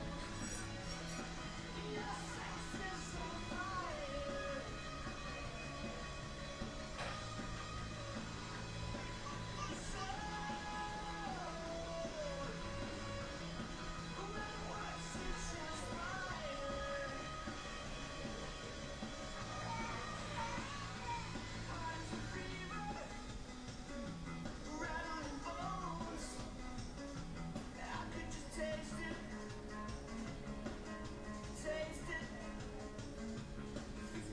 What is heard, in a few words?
weird instore karaoke